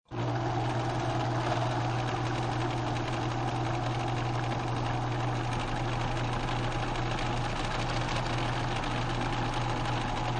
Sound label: Sewing Machine On Run Turn Off, Household, Sewing Machine, Old, Turn On, Run, Turn Off; Miscellaneous Household